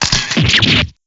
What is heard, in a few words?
damage